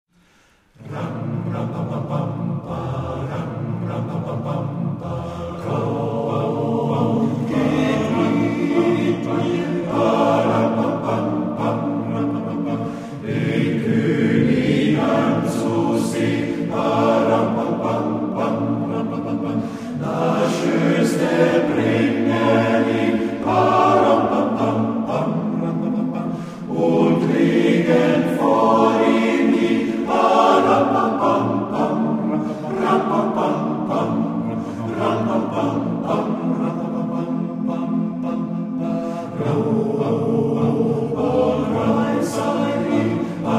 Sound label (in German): polizeichor